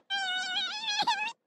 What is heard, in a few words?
cry; teefault; ddnet